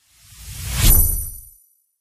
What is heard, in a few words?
substitution symbol enter; shangrila